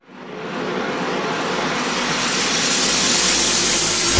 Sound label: Sweeps Medium